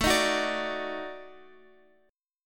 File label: D sharp Minor Major 7th Flat 5th A